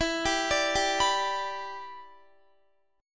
E Minor 7th, down, Guitar; arpeggiated

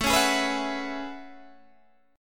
A Major 13th, Guitar DADGAD

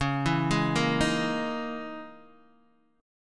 Db Minor 6th Add 9th Db down Guitar Open D

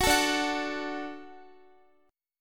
D Major D down Guitar; strummed; tuning 4E,4A,5D,5G,5C,6F

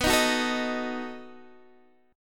B 7th B down Guitar Open D

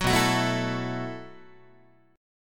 B 7th Suspended 4th B down Guitar

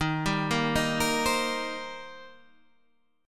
Eb 6th Eb down Guitar Standard; Eb6 arpeggiated; JGuitar

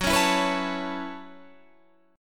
A 6th Add 9th Gb, guitar

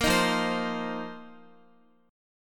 F 7th Suspended 4th F down Guitar; strummed

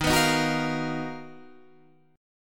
B Major Sharp 11th D sharp

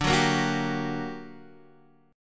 G Minor Major 7th D

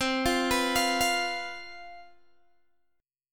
C Major 7th Suspended 4th, down, Guitar, DADGAD

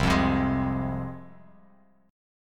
C Suspended 4th Sharp 5th, C, down, Bass Standard; Csus4#5 strummed; JGuitar

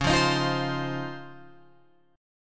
C sharp Major 9th, Guitar, Open G

